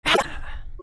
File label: wince, spirit; slender; dbd